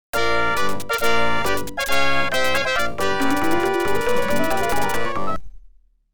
OPL2; X WING

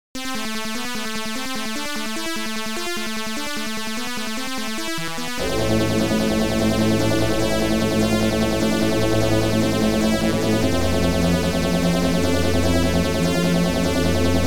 OPL2; Tyrian

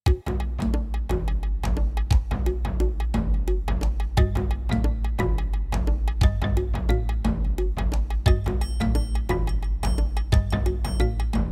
SC880

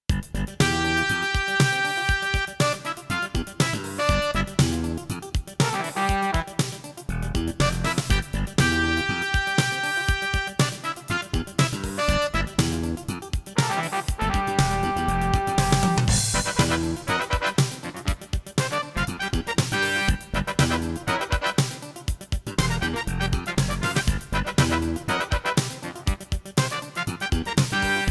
CM500 GS; LSL3

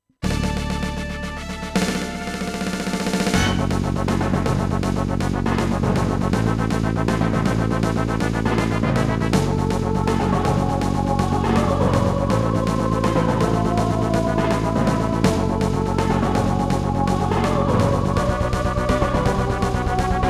SC880; Dune 2

